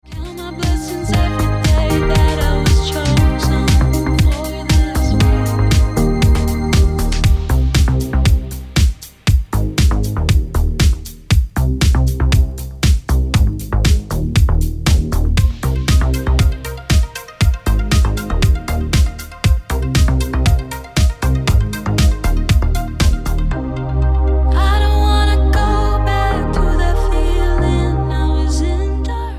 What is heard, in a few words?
Ringtone